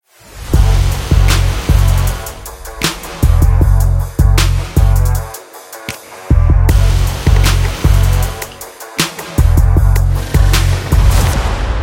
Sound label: Action Rock Hip Hop